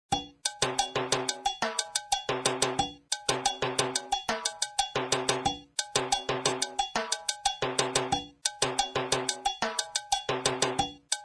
timba, timbales; rhythms

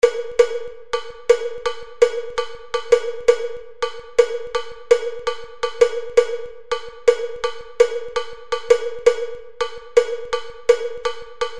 swing bell, cowbell; 3 ryhms for Campana used in salsa and Latin-jazz; Laurent Lamy

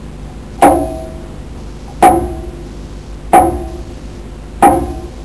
slap tone, congas; technique

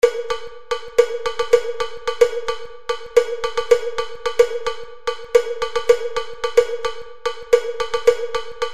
swing bell, cowbell; 3 ryhms for Campana used in salsa and Latin-jazz

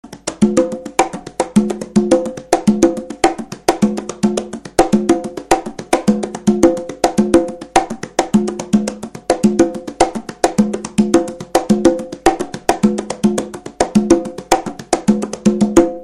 latin groove variations, congas; rhythms

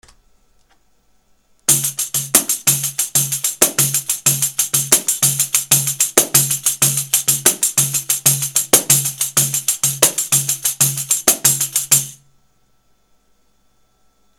Groove, pandeiro; This groove works especially good in shuffel; rhythms; Variation 1

